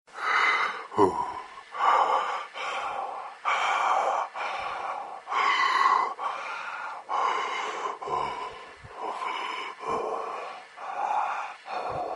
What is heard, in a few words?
Breathing Phone